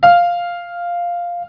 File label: piano